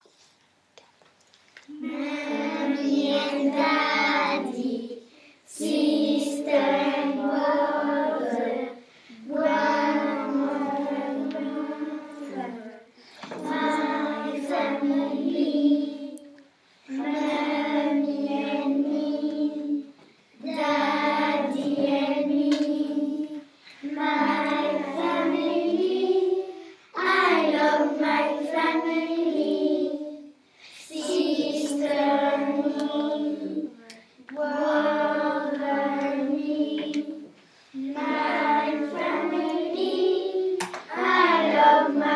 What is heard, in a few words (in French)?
chanson anglais